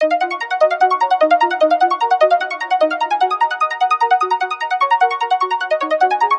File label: Arp D# 150, Melodic Loops; Daylight; Ghosthack - Color Bass Essentials